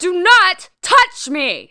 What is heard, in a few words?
BUMPING; Messiah; DOMINA